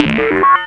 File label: harsh random, Electro FX; UNUSUAL KITS and FX; Atomhard Electro FX